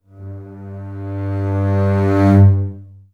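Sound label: basses crescendo G1; Best Service; Peter Siedlaczek's Advanced Orchestra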